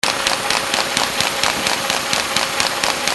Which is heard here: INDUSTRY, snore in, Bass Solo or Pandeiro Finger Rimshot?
INDUSTRY